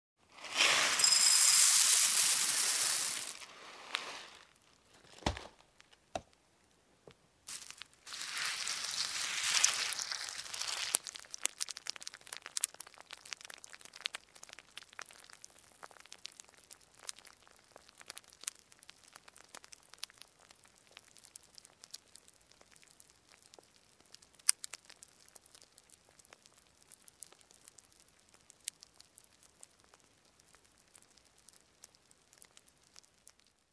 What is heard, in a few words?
Making Cereal with milk